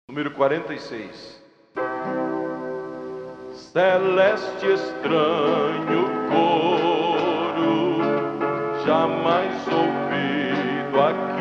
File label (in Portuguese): coro celeste